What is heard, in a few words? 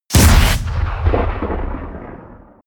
rpg shot close